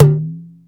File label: CONGA SLIDE; Hollywood Edge; The Big Bad Beatmachine